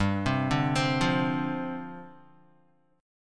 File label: G 7th Suspended 4th G down Guitar; G7sus4 arpeggiated